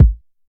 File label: hot kick single shot a key, Royality free kick drum sound tuned to the A note; kick drum samples